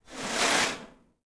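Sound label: metal slide medium; objects; Star Wars Obi-Wan; XBOX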